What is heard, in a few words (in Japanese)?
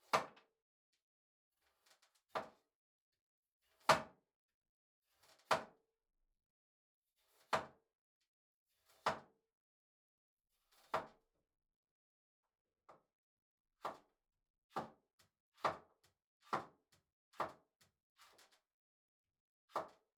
切る にんじん, 食事に関する音; KU100